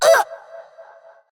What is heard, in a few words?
Death; Princess